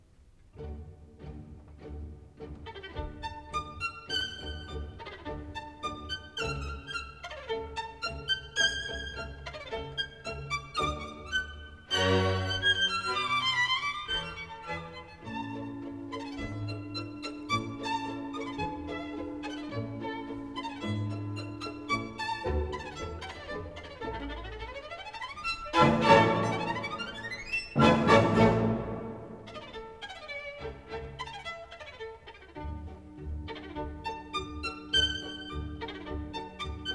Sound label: Violin Concerto in D, Rondo (Allegro spirituoso); Paganini